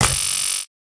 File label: skill unlock